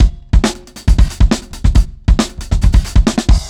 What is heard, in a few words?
Big Brown, Breakbeats, Drum n Bass; KJ Sawka; Drum n Bass & Beyond